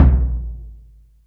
low tom sample c key, Royality free tom single hit tuned to the C note. Loudest frequency: 124Hz